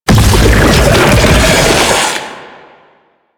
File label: Dig; Unearth; Octopede; Warframe; Man In The Wall